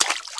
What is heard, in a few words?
Footsteps Wet; Lara; Playable Tutorial Level